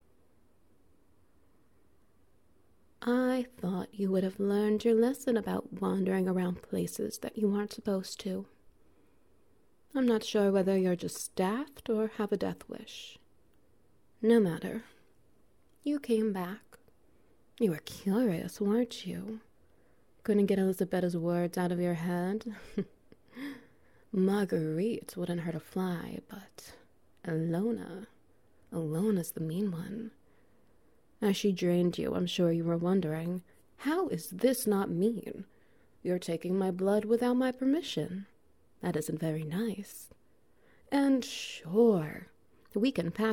Wet Noises

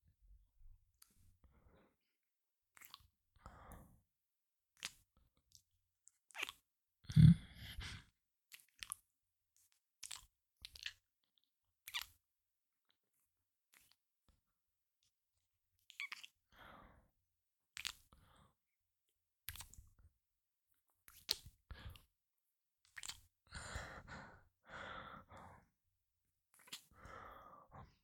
smut soundscape

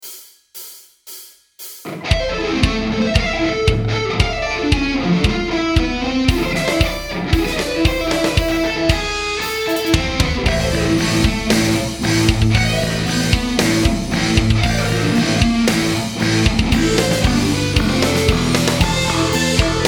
super sweeps

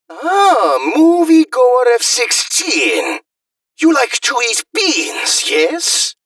mantis beans sfx

